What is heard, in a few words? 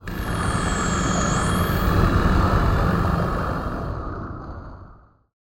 magic resonance; soundscrate